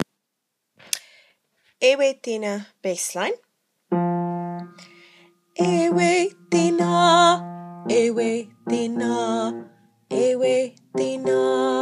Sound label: Bass